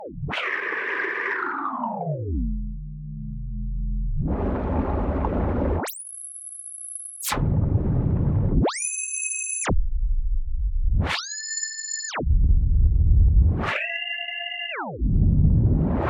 Electronic, radio, interference, oscillators, heavy modulation, multiparameter; Eiravaein Works; Vaeyan IV